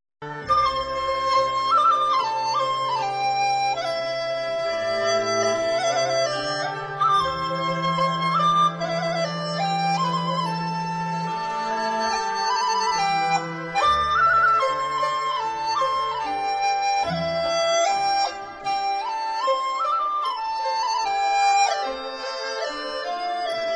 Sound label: dizi; chinese